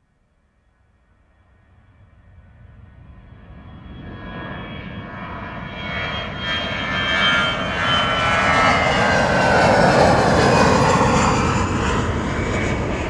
transportation aircraft commercial jet pass low external; sound effects one